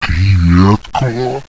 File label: zombie alert; boomario